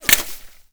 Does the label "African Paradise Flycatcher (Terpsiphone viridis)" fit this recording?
No